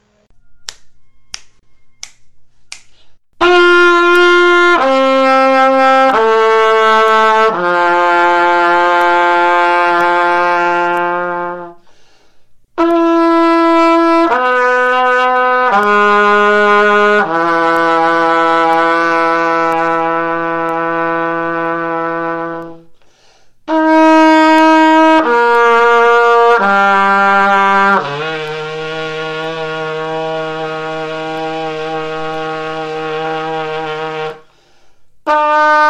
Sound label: pedal tone exercise, trumpet